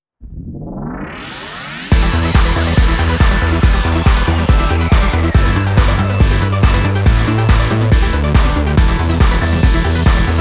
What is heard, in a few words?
off Vocal; steins gate audio series laboratory member 008 suzuha amane